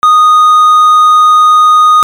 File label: ALARMS